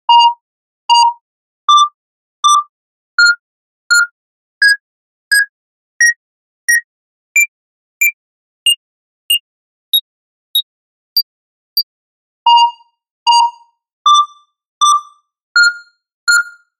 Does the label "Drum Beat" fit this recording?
No